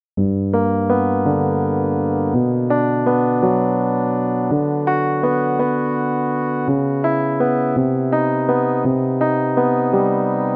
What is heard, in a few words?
Piano Tracks Gamma for Keys